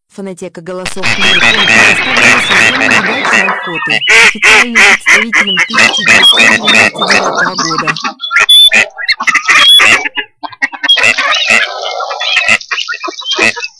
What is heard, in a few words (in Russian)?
Серая утка